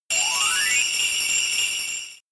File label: Bell; Heal Bell